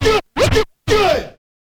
scratch kit, vinyl

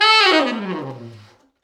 T Sax falls, Horns Sax

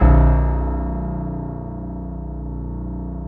EPM PIANO, KEYS, Synthesizers

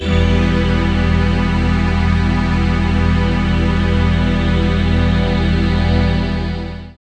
PAD Dance; Best Service Gigapack 1

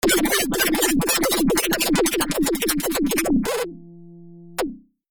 musicu

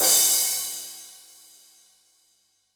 CRASH, Yamaha DD-65 CYMB

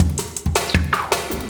Loop, Drum & Bass, 160 BPM

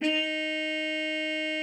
HARM D#4, TR MUTE; Xsample VOL-3

